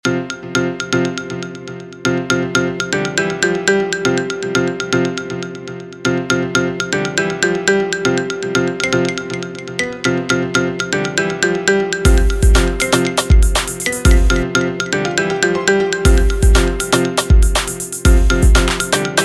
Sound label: Hip-Hop Fresh Ringtone